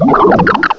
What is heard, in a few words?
duosion, cries; direct sound samples